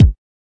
kik

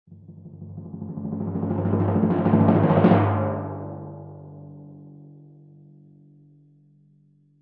timpani crescendo; Western and Latin Percussion; single hits

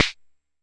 Jomox MBrane, drum machines; Jomox MBrane 11

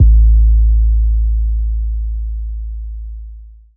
trap bass; 7rystan Trap Bass

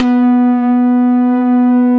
Synthesizer Middle C Notes, Lead

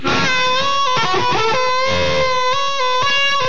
distorted blues harp; SAMPLED MUSIC

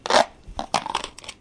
glonk rattle crunch; Weird and Interesting Hits; single hits

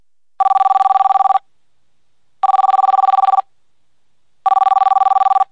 electro phone ringing; Sound FX Real Life

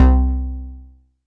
mbrute syn, Arturia MiniBrute

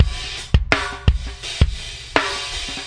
hardrock giant gate, 083.5 bpm, drum loop

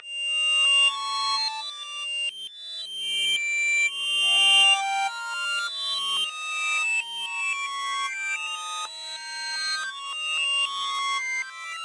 creepy backwards strat; GUITARS

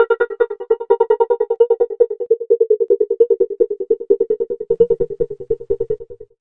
stutter lofi round synth, SYNTH AND ELECTRONIC

